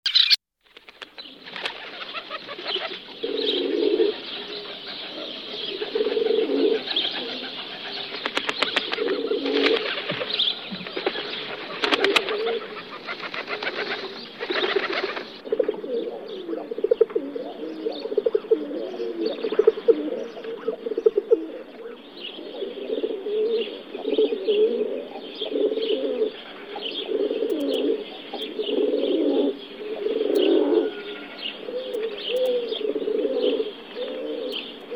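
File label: sizyy golub voice, rock dove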